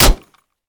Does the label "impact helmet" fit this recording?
Yes